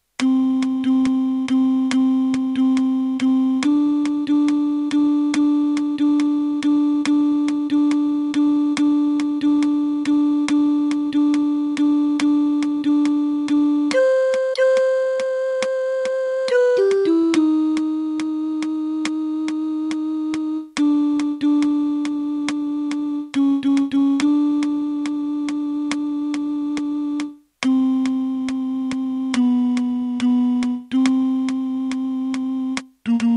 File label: alto